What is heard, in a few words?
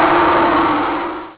groaner; spacehulk